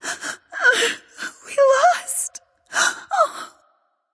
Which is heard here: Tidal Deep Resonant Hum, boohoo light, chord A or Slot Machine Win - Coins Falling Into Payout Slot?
boohoo light